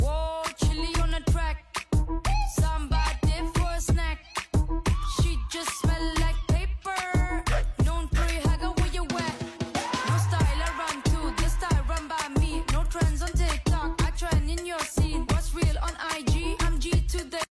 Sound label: funny music